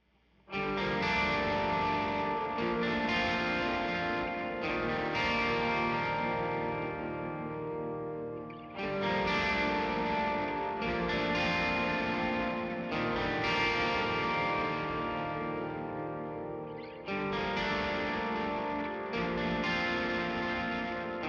gtrs

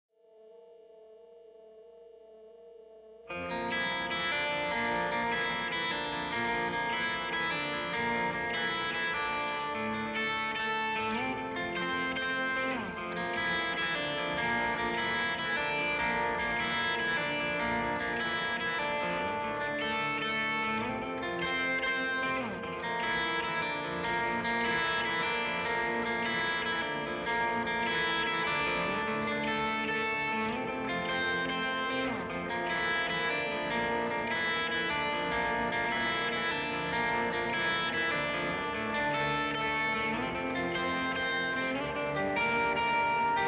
gtrs

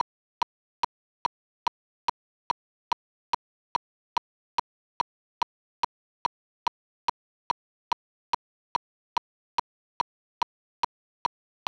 click

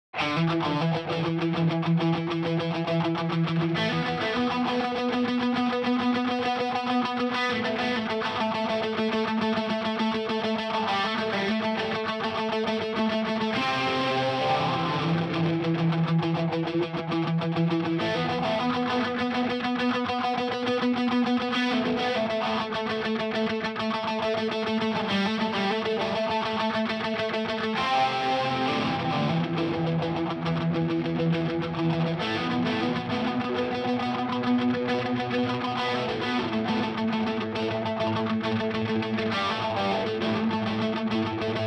b gtr

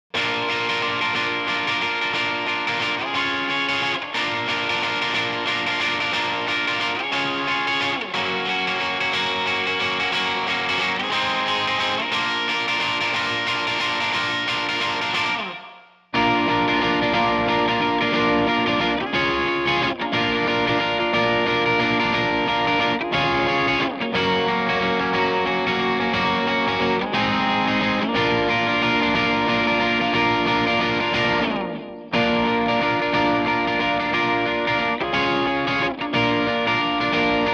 c gtr

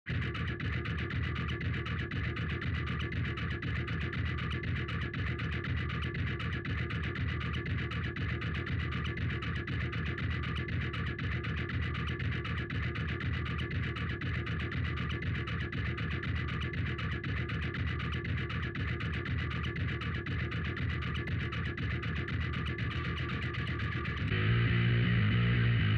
bass

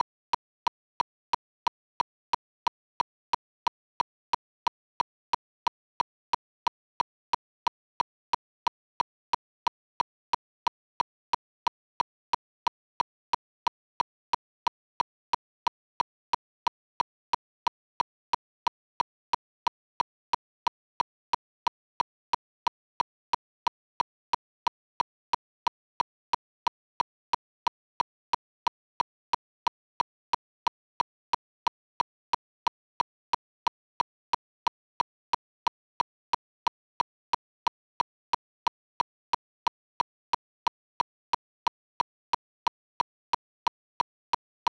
click

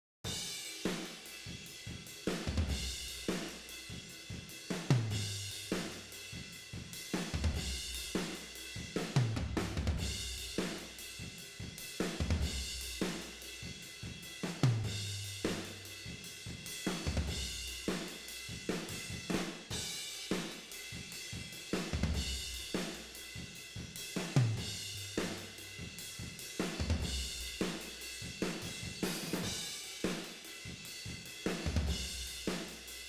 toms, ovrhds, room

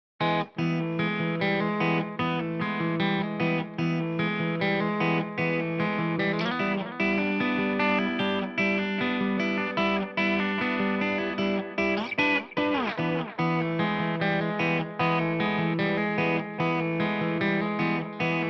b gtr

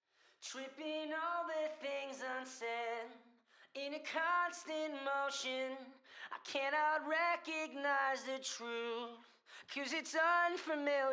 main vox

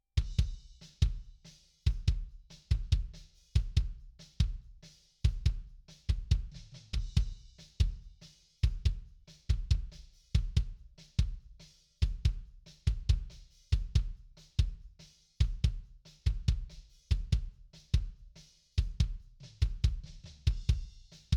kick

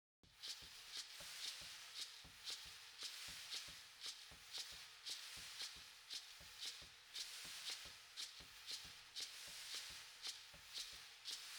perc, acoustic

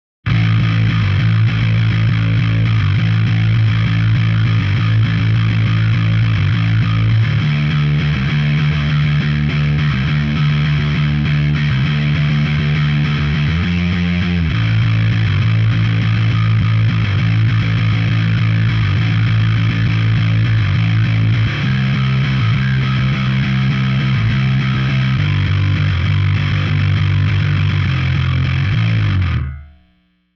bass

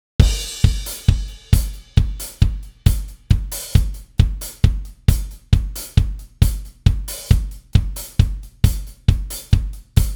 drums

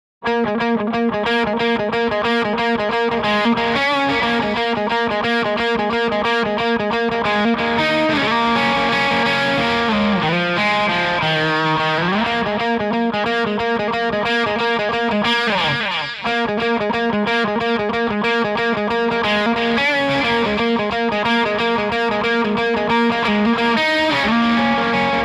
b gtr

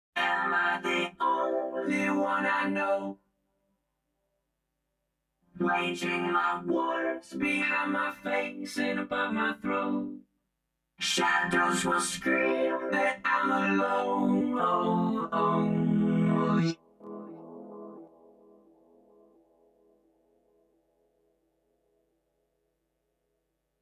Lead Vocals

